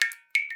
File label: Drips